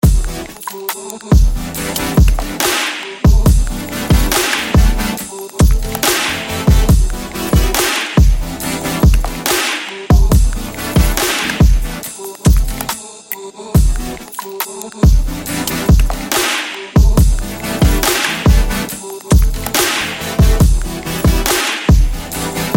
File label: TRAP future bass, ringtone